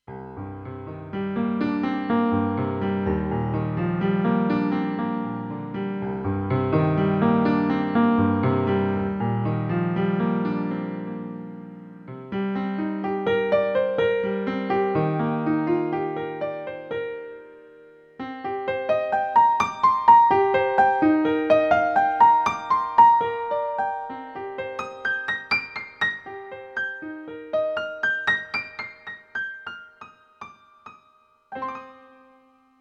Piano

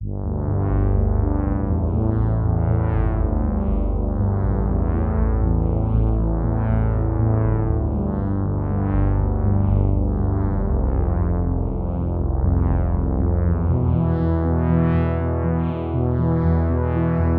Xils PolyKB3 Boring Brass

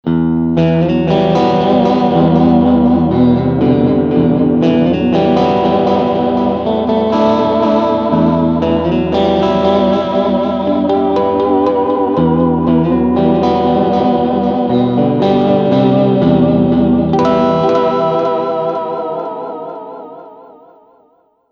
Mod Delay

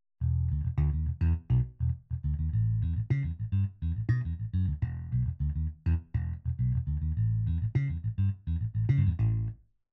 Roland Fantom XR Bass

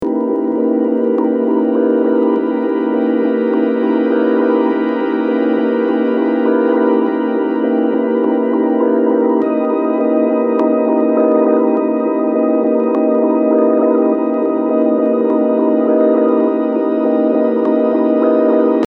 pad IN

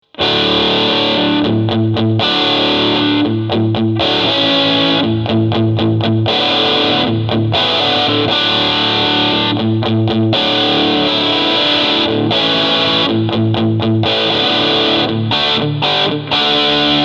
EHX Dirt Road Special Overdrive; Tone 12 Bite 12

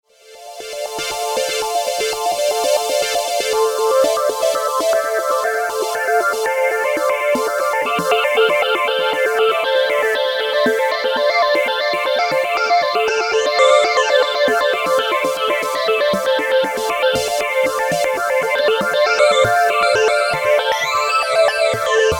phaser

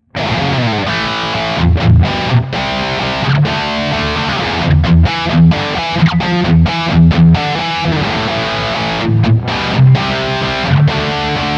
Les Paul Highgain Rhythm Bridge Pickup; Slash Signature

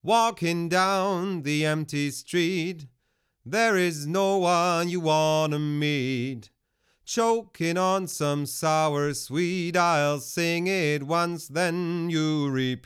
ONE LA 500 Vocal Medium Limiter; IGS Audio

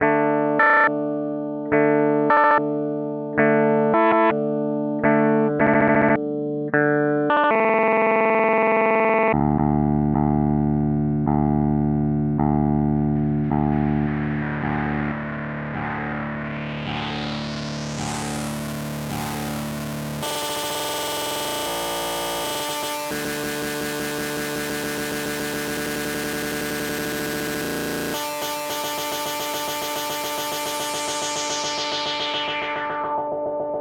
Stutter; Ottobit

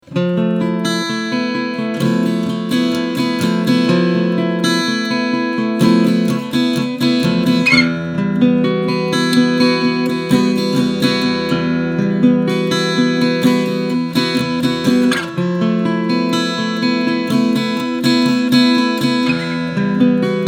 Cort Gold Mini Mix

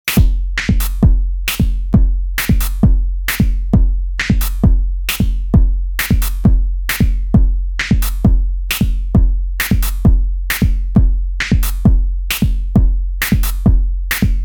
Beat; Five12

